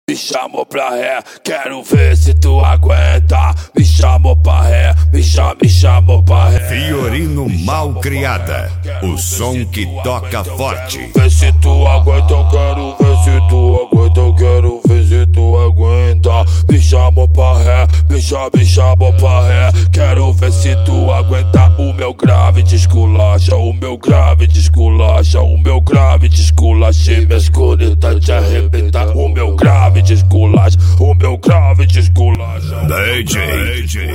esp mega funk bass

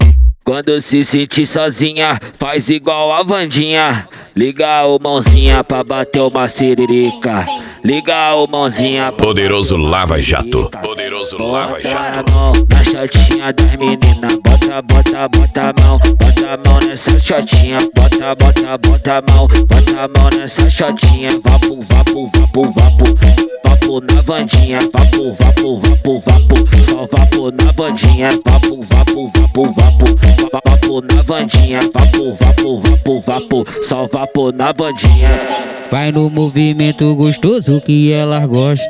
funk sertanejo

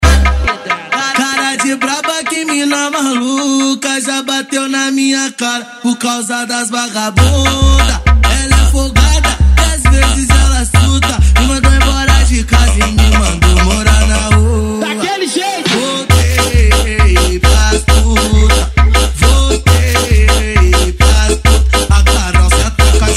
funk ritmado; especial cuiaba

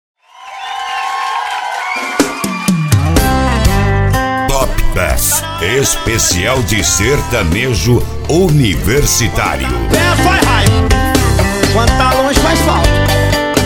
sertanejo; top bass

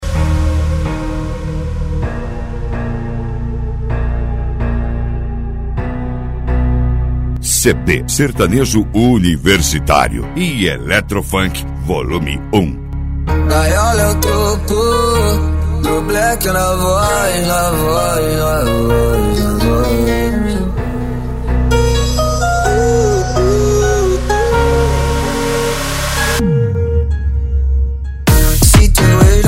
eletro funk e sertanejo

